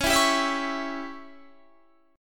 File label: Db Diminished Db down Guitar Standard; strummed; JGuitar